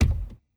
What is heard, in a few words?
reels stop; BARs 7s; wazdan; ultrafast